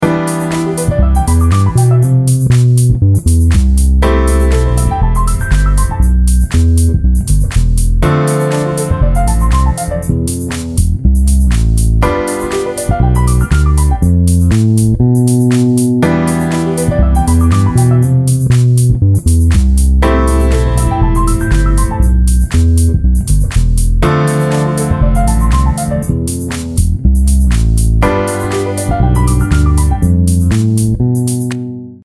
bass Cmajor, play the vii chord (Bmi7♭5) when the keyboardist hits the V chord (G7); a bassist's guide to chord substitutions